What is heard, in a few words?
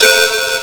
durrrhhh; Truth-Hz - 1-Hit KO Sample Pack